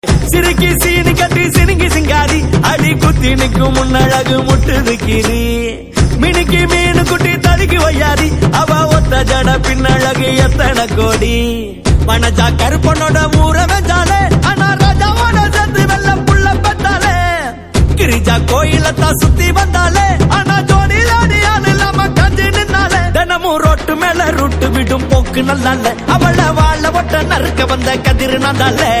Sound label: music ringtone